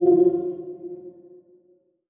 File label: Transition Bell Warble; Production Element Title Transition